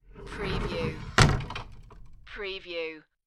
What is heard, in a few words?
Closet Door Close